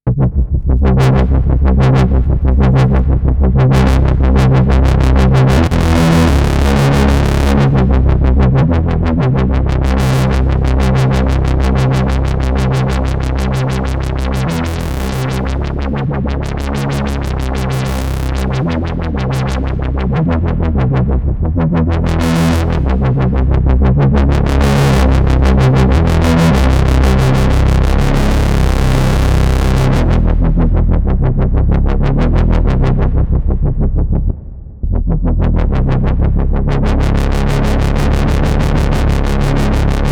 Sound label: Dub Step Bass